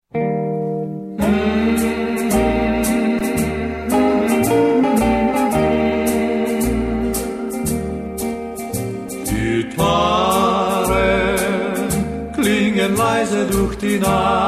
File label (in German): gitarren